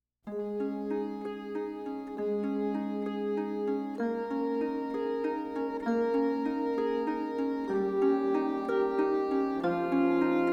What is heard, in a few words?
Miniature Instrumental Version